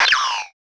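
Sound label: FX synth; Sound & Vision - Gigapack II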